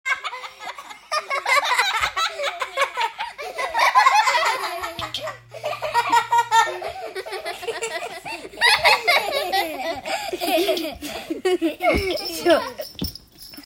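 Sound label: child's laughter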